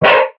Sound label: wolf hurt